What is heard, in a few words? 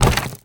Shield Under Attack